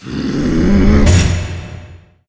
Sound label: kentauros, monster